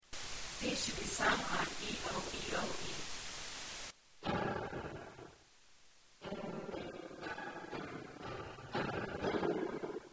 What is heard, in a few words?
vocal eeohee, warm ups